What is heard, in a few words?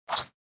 Chomp Sound; sushi cat